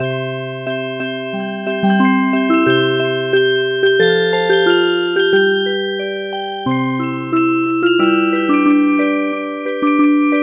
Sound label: Music Box; Thai National Anthem